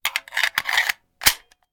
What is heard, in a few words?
Hand Gun Foley; Sound Ideas; Guns